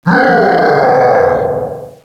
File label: gawwww, Echo (inside caves, underground ect.); DS; ML BOWSERS INSIDE